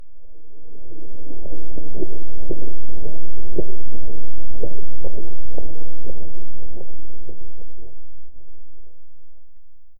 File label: thick bubbling of molten, elemental